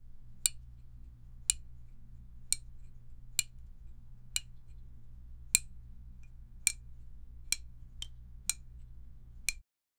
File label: optional micro metalli, blade